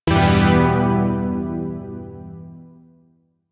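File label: Shawzin Major Chord; Zariman Shawzin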